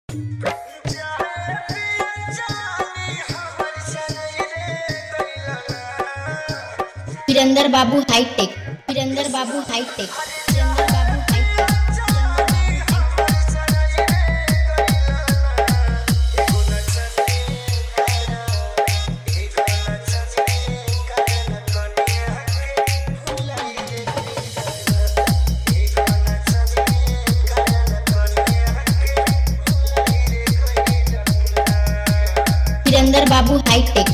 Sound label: hard bass remix